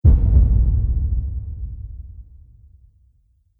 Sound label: Heartbeat One Shot Big Reverb; Heartbeat Sound Effects